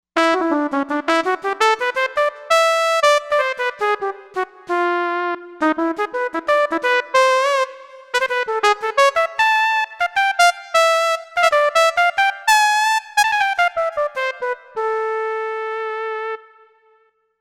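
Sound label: Brass Solo Trumpet Switch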